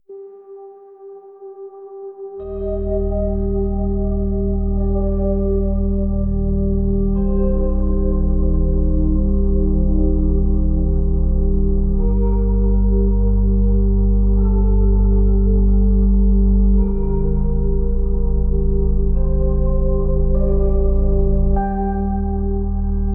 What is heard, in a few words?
ambient ocean; freepik